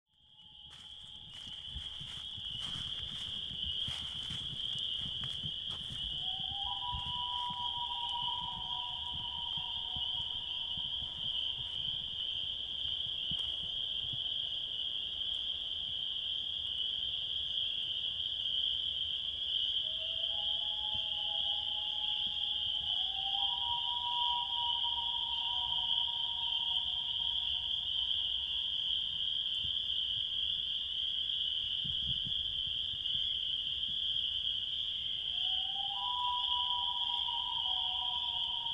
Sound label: Looney Loons; Wisconsin 2019